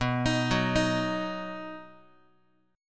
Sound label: B Major, Guitar, 4A,4E,5A,5D,5G sharp,6C sharp; arpeggiated; x,7,6,4,7,x; JGuitar